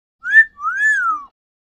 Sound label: Macaw Vocalizations